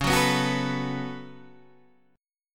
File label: C sharp 7th Suspended 4th Sharp 5th C sharp Guitar Open D; down; chord shape